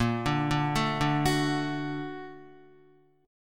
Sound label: A sharp Augmented Major 7th A sharp Guitar; A#+M7 arpeggiated; JGuitar